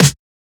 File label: Snare; FLPRO